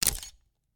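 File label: ice camera, attack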